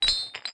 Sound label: Ball And Chain Rattle